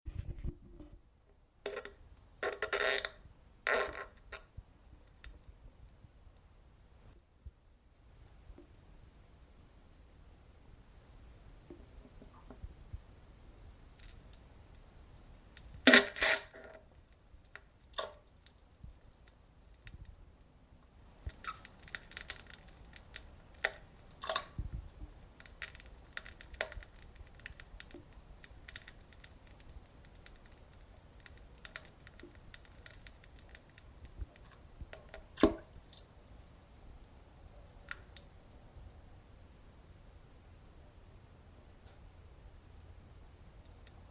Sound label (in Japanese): なんべんおなら ぷぷりぷむちゃちゃ ぴちゃ音